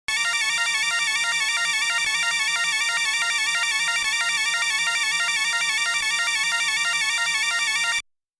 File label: Cyclone Beam Shot